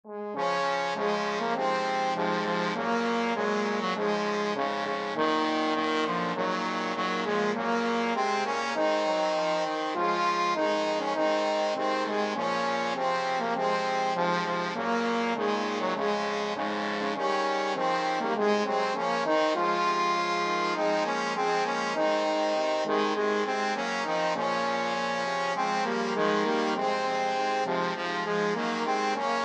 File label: trombone quartet